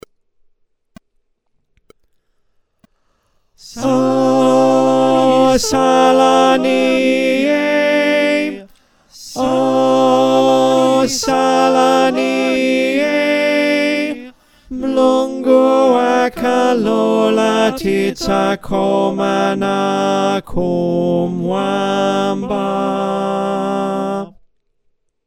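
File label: Tenor, choir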